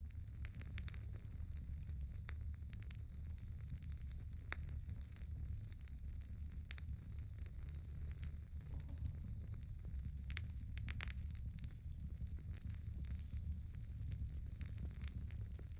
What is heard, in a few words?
Torch Burning Loop; side quest society